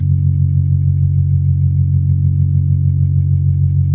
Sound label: B3, First Call Vintage Keyboards